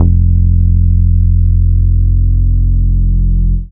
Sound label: DAF BASS; 90bpm; Demo Multitracks